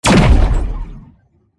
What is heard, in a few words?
shotgun; voidling